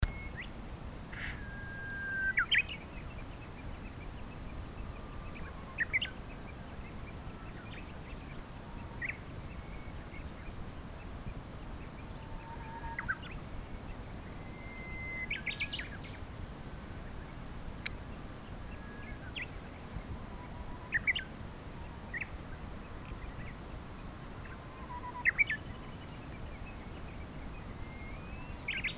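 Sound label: uguisu, Japanese bush warbler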